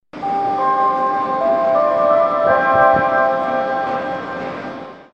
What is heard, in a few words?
Hungary MAV Jingle